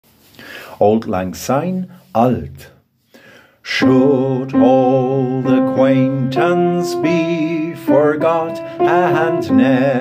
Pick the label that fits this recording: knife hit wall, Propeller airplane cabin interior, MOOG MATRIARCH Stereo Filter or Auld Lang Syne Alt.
Auld Lang Syne Alt